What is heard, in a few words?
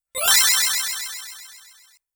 Credit Sound